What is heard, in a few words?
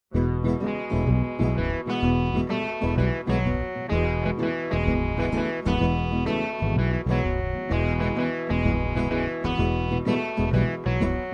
eagle rock vocal, square dance